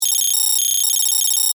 Data Beeps Readout, Scifi; Tech; BlastWave

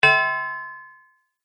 Metal Low Hit; rock

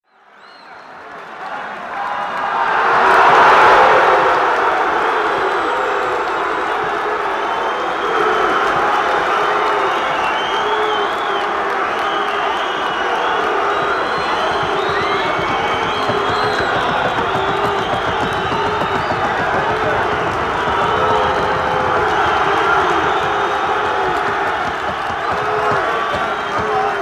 Rowdy crowd with booing, etc; Crowds (Sound Effects:Backgrounds); BBC

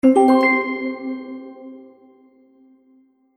Magic Purchase Ding; Cartoon Sound Design

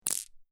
Marble Impact With Several Marbles; Small rattle of impact from marbles or pebbles.; Miscellaneous Toy Sound Effects

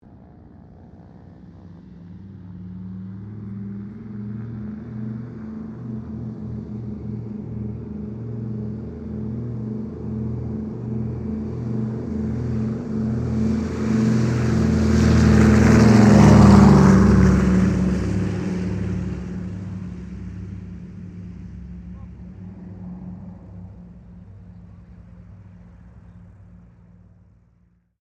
AIRCRAFT DOUGLAS DC3 TAKEOFF; Airplanes; Vehicles; Qantas 1942 DC3

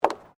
FOOTSTEPS WOMENS HIGH HEELS BOARDWALK STEP; Footstep Foley; Human

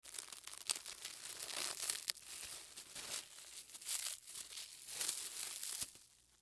Plastic Wrap, Plastic Wrap Pull Sheet Out; Materials; Household; Blastwave

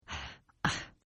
Sigh Female; Exhaling; Voice; Partners In Rhyme

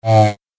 Arcade Game Flyby Zoom; Video Game Sound Effects; Retro Inspired Sound Effects - Think Nintendo, Atari, C64, Etc

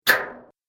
Magic Air Swipe; Magic Sound Effects; Andrew Fleming; Twistedtracks

